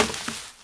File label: seed Pickup; Keepsake